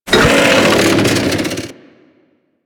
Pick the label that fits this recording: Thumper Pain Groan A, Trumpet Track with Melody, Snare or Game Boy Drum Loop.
Thumper Pain Groan A